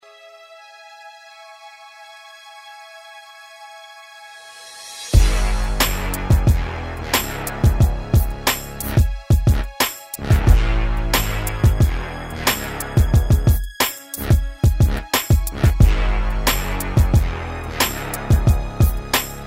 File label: hip hop